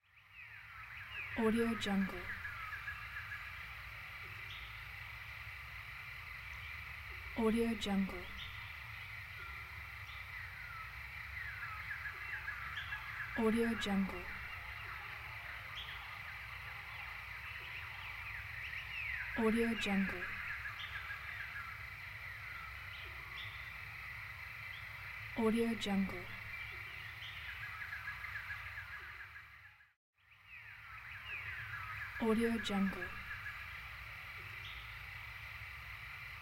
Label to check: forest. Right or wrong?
Right